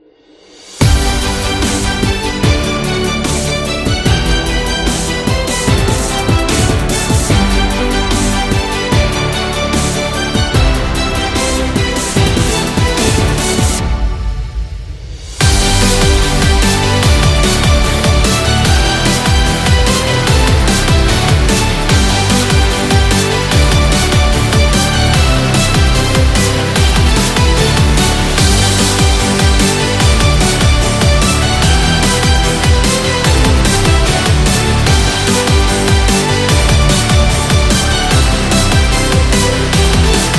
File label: Sport Motivation; high energy